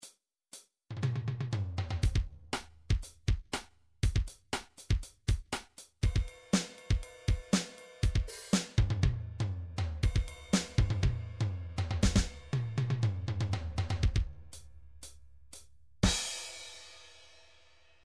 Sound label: midi drums ballad